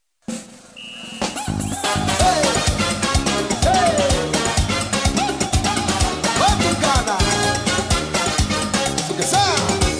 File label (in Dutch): Samba voorbeeld